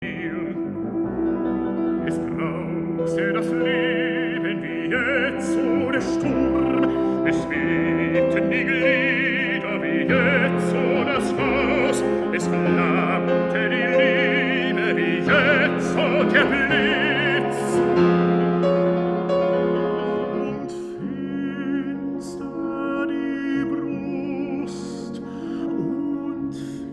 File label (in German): Vokalmusik